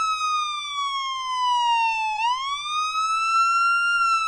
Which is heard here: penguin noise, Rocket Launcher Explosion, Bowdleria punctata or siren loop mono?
siren loop mono